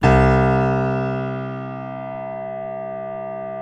PNO FF; Synclavier Sampler Library

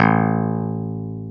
A1, CLAV; Sonic Reality; Vintage Timetraveler; CLAV PIANET

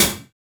TYRE, Impacts, Hits & Booms; Distored Reality

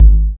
127 DRM, Fresh Disco House

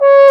BRS F HRN, French Horn Sh; Roland L-CDX-03 Disk 2; F.Horn 4 Sh

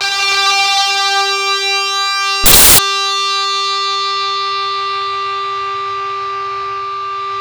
ZWEEP; Spectrasonic Distorted Reality 1

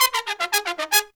Pop & Funk Brass, R; Best Service ProSamples vol.25; AKAI

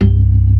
ORGAN, C3 PERC; Keith Emerson Signature Series

